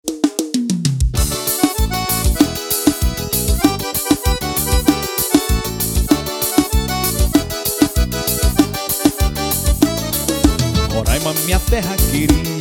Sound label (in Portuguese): forro